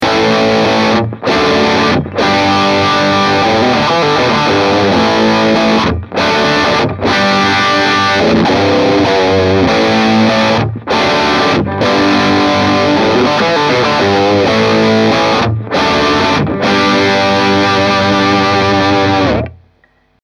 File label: Distortion SM57 on axis; Bogner